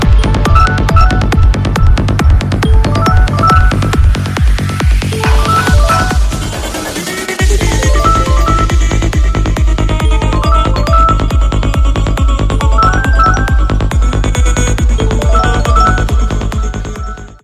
xiaomi redmi poco x4 pro 5g ringtone

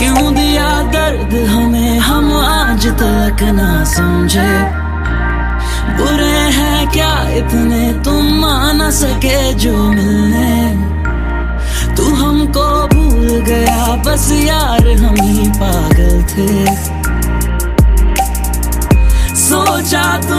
love ringtone, bollywood ringtones

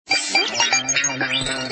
beep ringtone

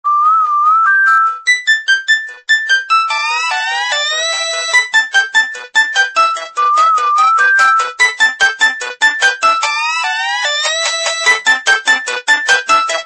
Nokia escape ringtone

